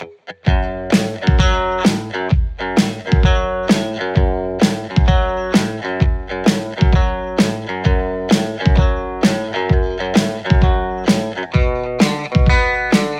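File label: Stratocaster; overloud th3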